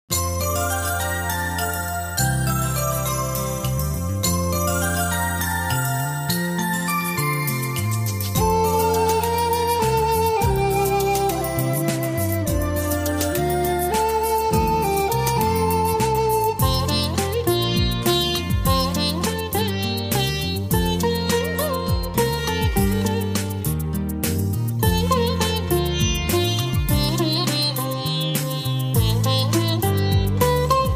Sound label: X'an Devotional Karaoke